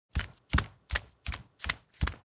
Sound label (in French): pas; fabrik